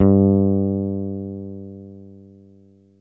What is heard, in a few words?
Big Synth Basses